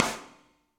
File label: Snare Sidestick Low Loud Squashed; Drums; Drum Hits; Standard Hits; Ableton; Session Drums Club